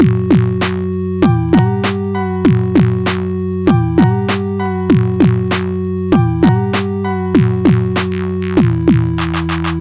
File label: Song Bass song